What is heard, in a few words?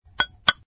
window tap